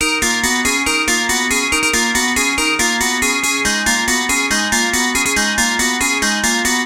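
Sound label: Melody Loop D# minor 140BPM, Dubstep; Murk; Ghosthack; Dubstep Sounds 2025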